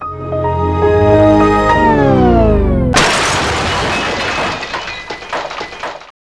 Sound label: crash; Windows; OH MY Windows sound